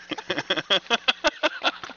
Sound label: laugh